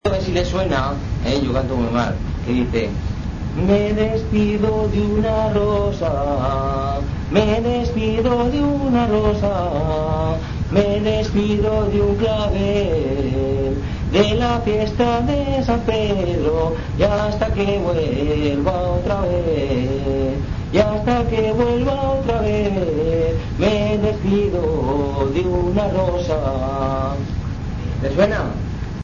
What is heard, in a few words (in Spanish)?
Jota De Blesa, Musica; El Hocino De Blesa